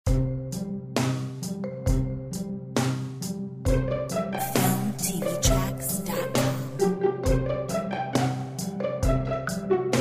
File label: Simple Game with drums